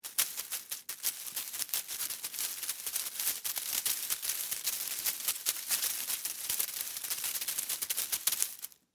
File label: Spider Tarantula, Walk, Leaves, Foliage; Animal; Arachnid, Insect, Bug; BlastWave